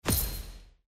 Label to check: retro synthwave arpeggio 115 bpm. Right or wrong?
Wrong